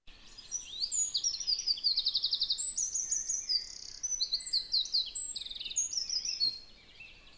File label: wren song